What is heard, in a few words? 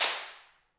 handclaps, Heart of Africa